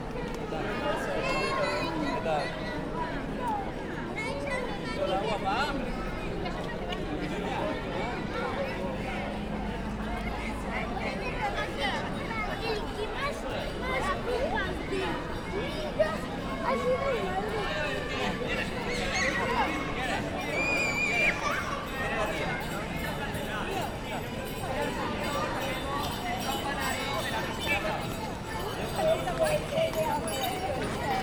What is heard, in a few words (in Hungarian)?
zsidónegyed hangulat